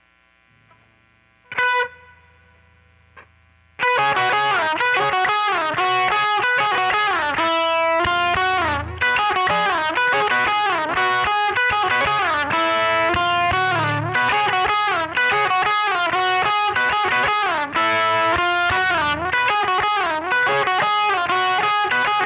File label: quilter solo